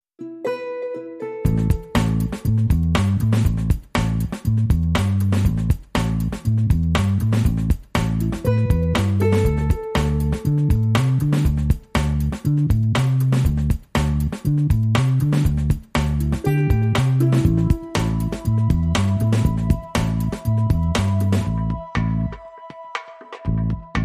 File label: Groovy